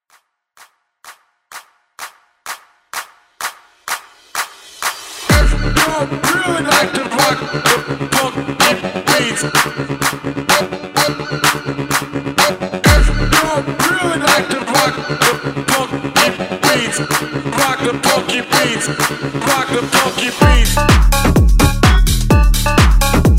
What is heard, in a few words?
Party Starter